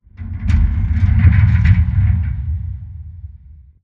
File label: dish crane move start metal stress; Titanfall 2